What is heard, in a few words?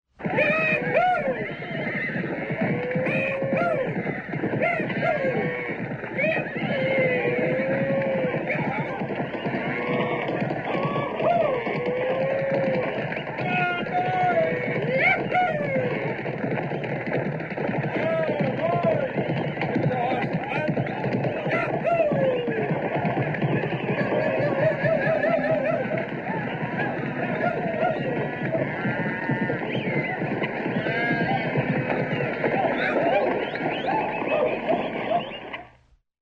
Cattle Drive; SOUND EFFECTS1